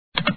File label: feet; football extravaganza